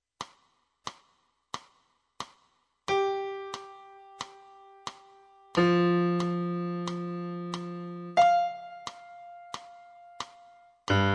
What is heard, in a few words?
piano; Notas na Partitura